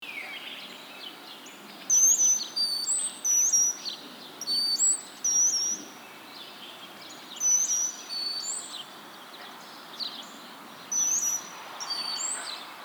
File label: Brown-headed Cowbird, Molothrus ater; blackbirds orioles